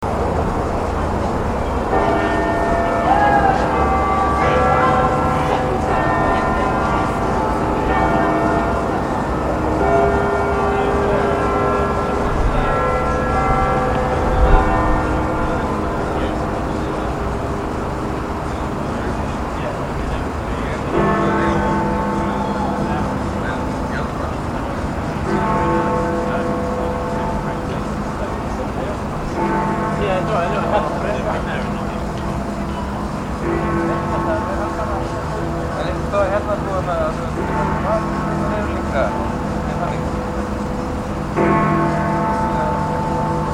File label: big ben